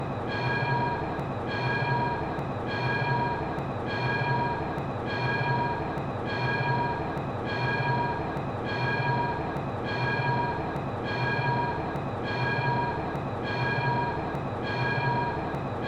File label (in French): tram tempo